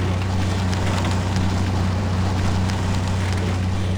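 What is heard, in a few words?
car drive, chicago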